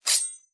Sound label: dagger exsheath